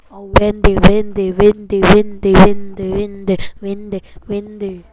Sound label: windy